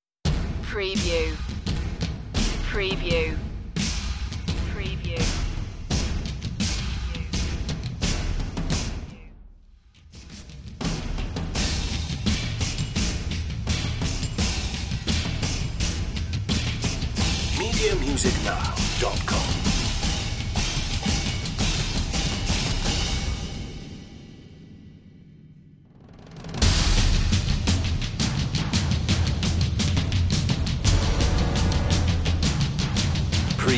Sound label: percussion